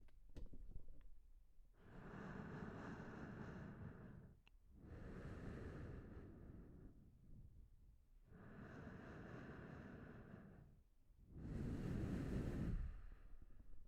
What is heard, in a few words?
Heavy Breathing